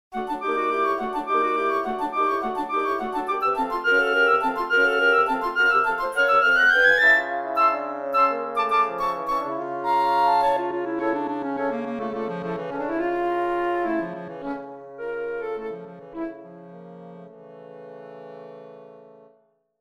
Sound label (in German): Floete